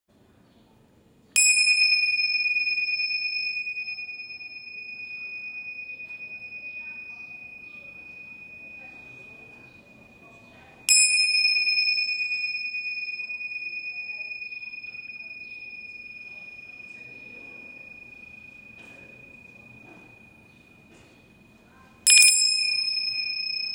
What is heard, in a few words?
tingsha